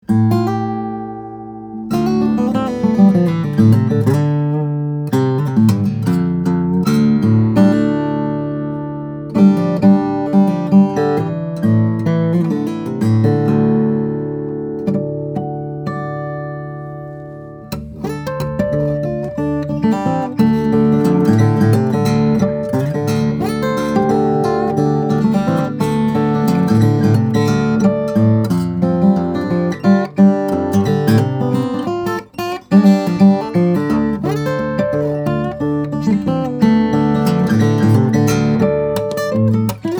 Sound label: OM, steel string flattop guitar; osthoff; woodstock; brazilian rosewood; sinker redwood